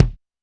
kick; Ableton Foundation FreePacks

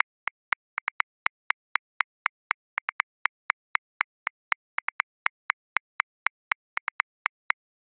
Punk Rock, Drum Booth, Grooves; Ableton

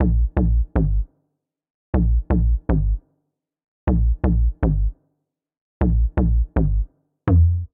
Bass G# Minor 124 bpm; Ableton; Factory Packs; Skitter and Step; Mango

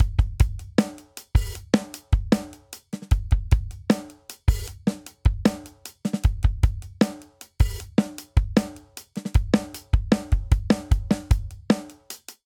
Grunge Rock Verse Variant 77 bpm; 4 4; MIDI Clips; Ableton; Factory Packs; Drum Booth

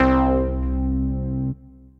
Syn Keys; Logan SynKeys; Ableton; Singularities

